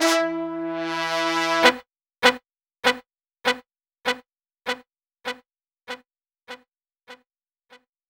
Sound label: Horns Intro, Cinematic Funk Urban Tone Poems; Sonic Foundry (Sony Creative Software)